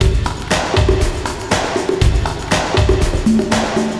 Drum test wet; lego universe; Nimbus Station

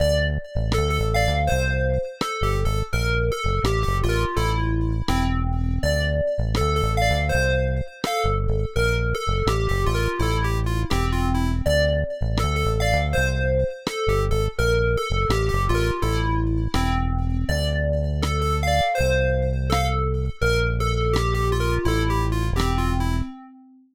shop song (rough); Secrets of Arcadia

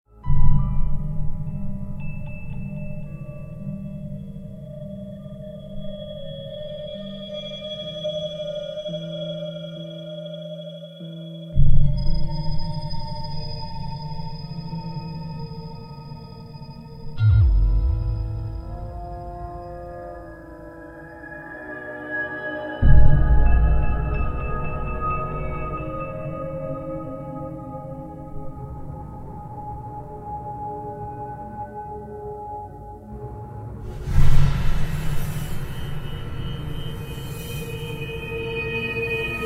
menu; razenroth linux windows gamerip 2015

